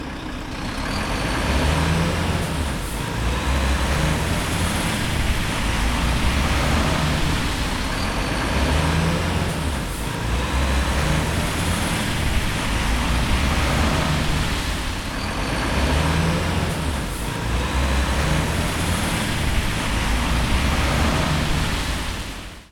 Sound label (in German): Lastwagen starten